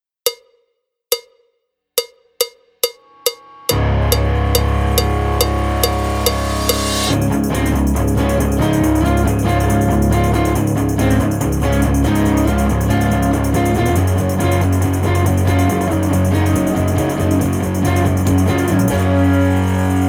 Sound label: backing track without click